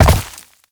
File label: land vegetation; Movement; Warframe; Uriel